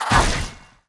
damage; BloodAssassin

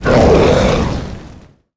Pickax Miner death; KuberaMine

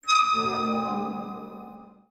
cage

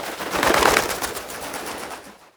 Crow wing; Kethotum

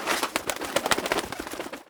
Crow wing; Kethotum

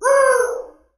cursed temple damage

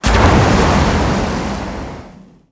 Liquimon wave; SwampOfDarkness

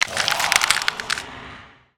Skull bug env vox; IgnisVolcano

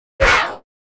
Mon rabbit strange attack

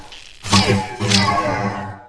blade attack

